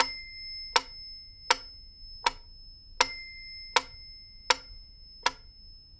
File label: METRONOME 80; AKAI S6000 CD-ROM - Volume 6